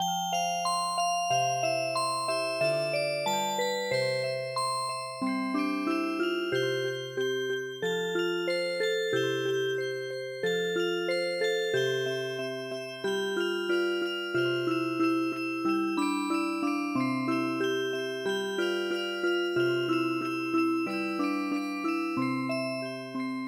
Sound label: Music Box, traditional